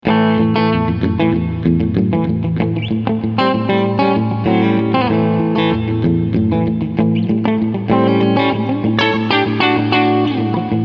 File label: Twin Basic